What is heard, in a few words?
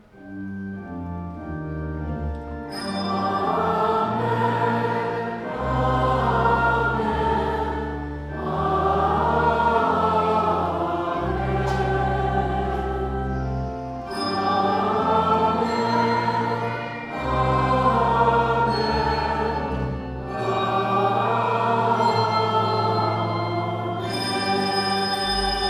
Amen; StJ Bells Easter